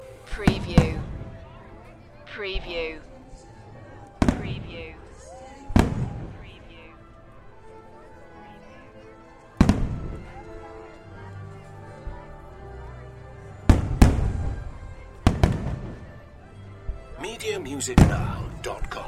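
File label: Fireworks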